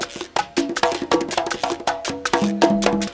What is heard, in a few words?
PERCS LOOP; Crypton - DrumBase5000